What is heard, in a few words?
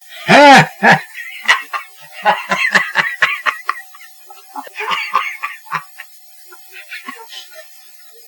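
grandfather laughs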